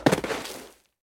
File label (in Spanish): caida